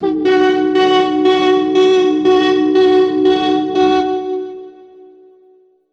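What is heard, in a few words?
Reverb Proc, alarm siren samples, 120bpm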